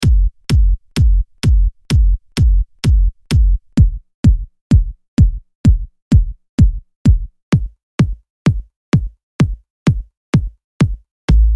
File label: ultra kicks